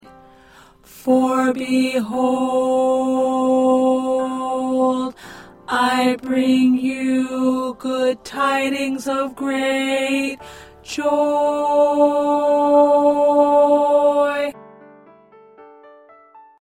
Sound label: Tenor